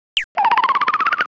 attack

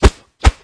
backdash

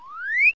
JUMP

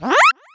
attack

